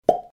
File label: Plop